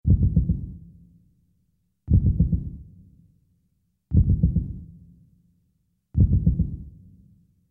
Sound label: heartbeat sms